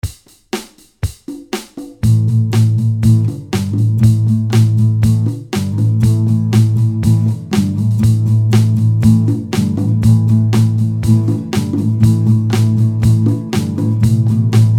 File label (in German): Kleiner Livemix mit Zoom Drums